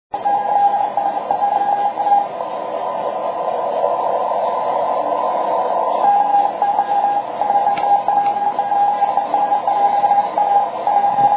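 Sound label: 20m continuous wave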